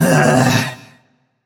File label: ugh; smite; ares